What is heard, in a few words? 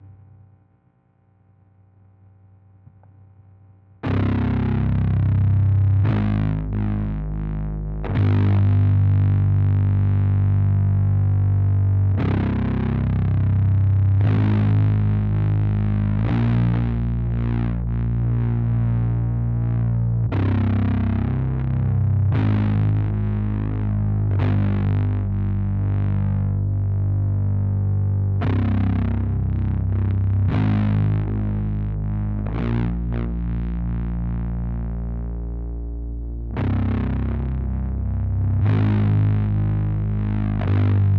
bass; spinebashed; Bridge; 118bpm